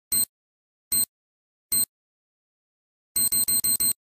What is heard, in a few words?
bip; svencoop; angel